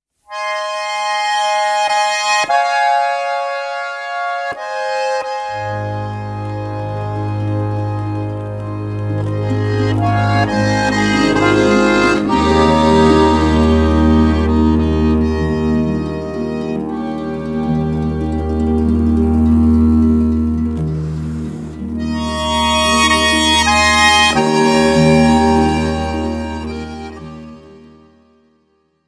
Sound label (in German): staad schleiniger